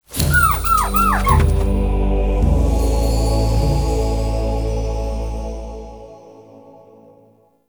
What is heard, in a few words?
Fawn Mote Acquired; Animal Motes